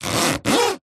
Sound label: office sticky tape hand rub squeak